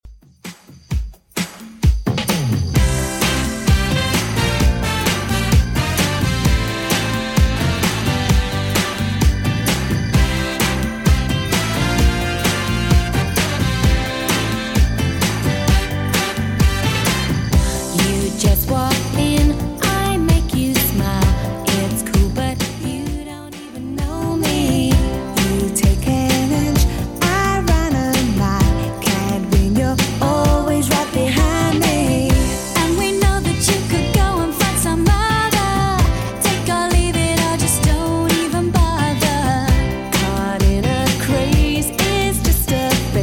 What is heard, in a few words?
90s Redrum 130bpm